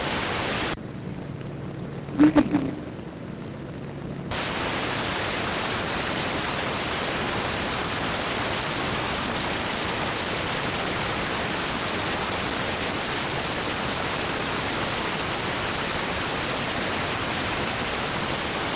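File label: radioteletype receive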